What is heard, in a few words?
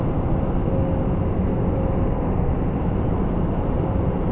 rocket mono; cstrike; de spree